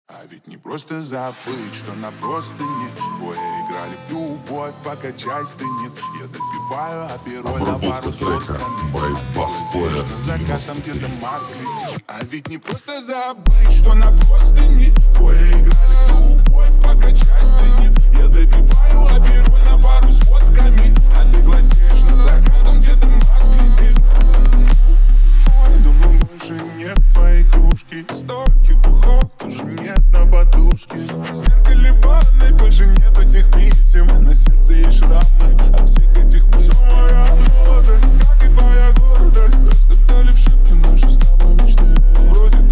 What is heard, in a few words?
(28-31hz) Low Bass